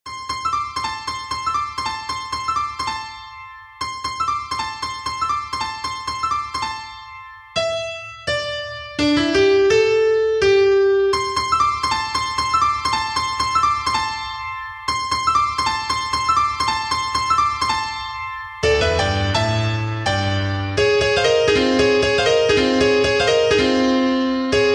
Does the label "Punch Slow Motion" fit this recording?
No